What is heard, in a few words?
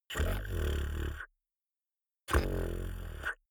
beatbox tongue roll soft damped